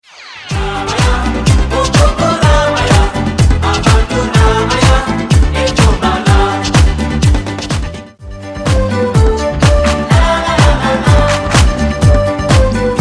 Ballo Di Gruppo Canto

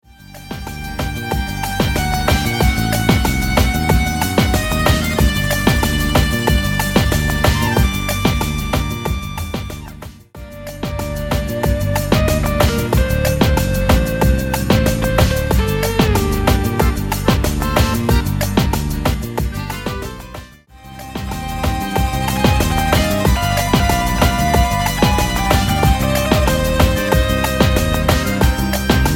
Groove Canto

